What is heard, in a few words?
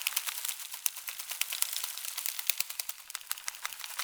Gravity Jump Butterfly Loop; Powers; Fey Thornbow